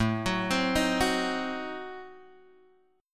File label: A Diminished 7th A down Guitar Drop D